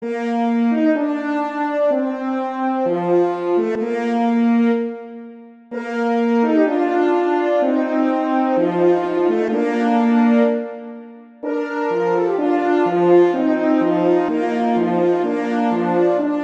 Largo; Telemann; Canonic

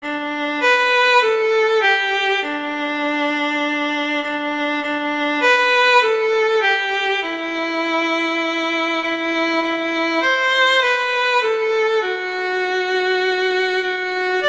Jingle Bells; Christmas Beginners

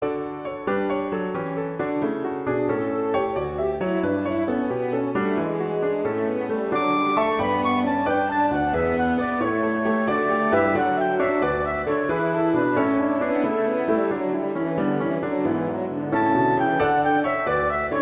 Gigue; Pachelbel; Canon

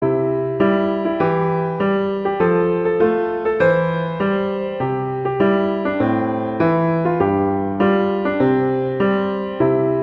O Holy Night, Christmas Beginners

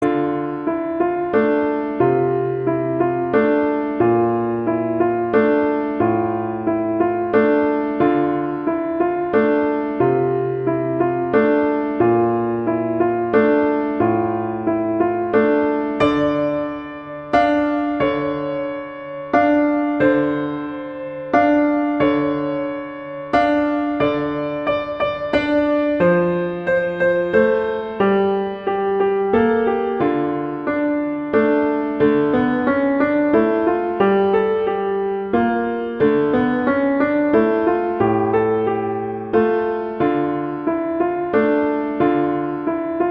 Carol of the Bells, Christmas Beginners

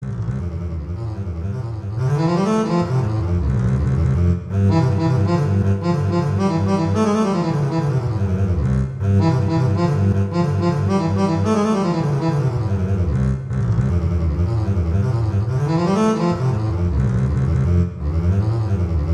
Waltz; Dragonetti; Waltzes

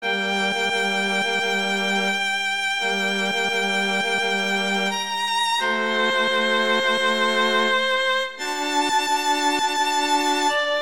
Adagio; Vivaldi; Summer; Quartet

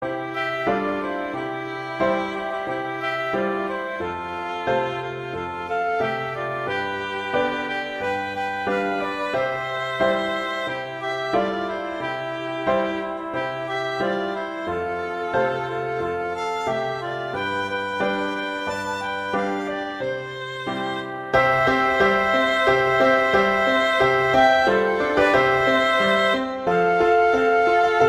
Fl Pf; Christmas; Jingle Bells

